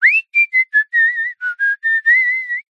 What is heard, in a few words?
human male whistle short tune riff